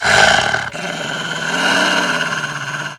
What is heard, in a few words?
groan, dog